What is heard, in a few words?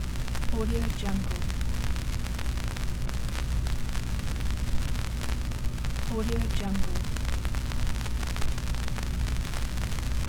vinyl noise loop